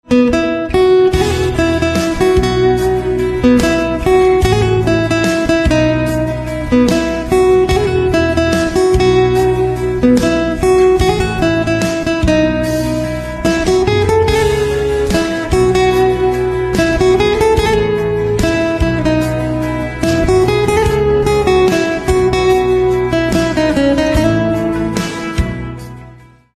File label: Guitar Instrumental Ringtone